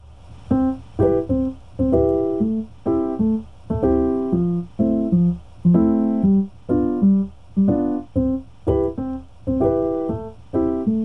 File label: piano version